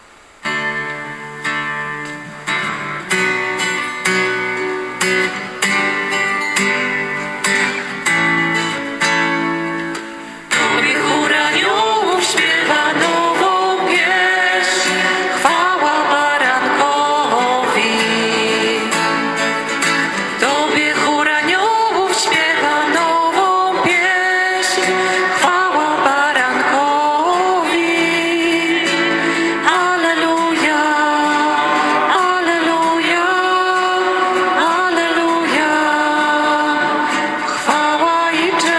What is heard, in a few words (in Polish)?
chor aniolow